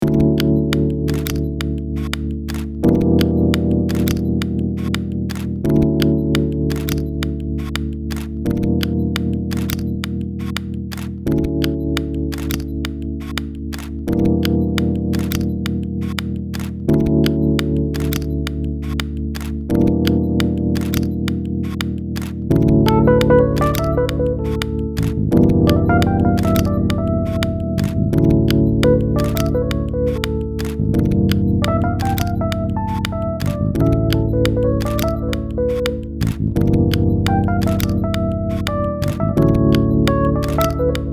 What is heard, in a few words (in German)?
Canon in A dur